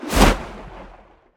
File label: flame whoosh; Smite; Sol